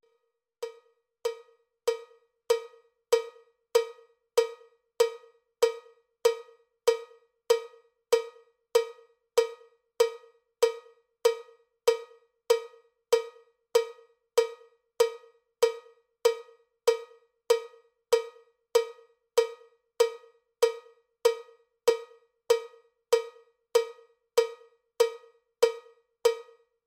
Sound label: cowbell click track bpm 79; cowbell click track bpm 96